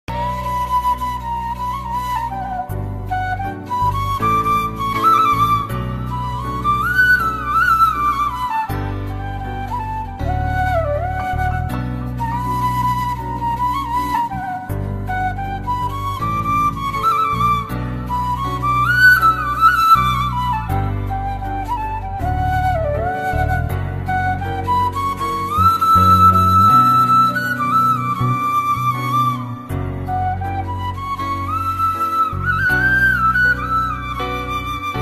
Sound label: flute instrumental bgm